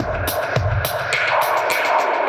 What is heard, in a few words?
Beat FX B 105, 105bpm; musicradar; dub designer; Beats